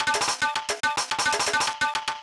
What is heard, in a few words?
perc